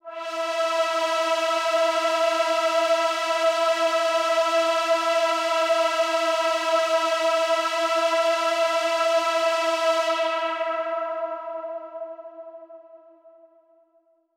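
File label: Big Pad D3; KG MegaSaur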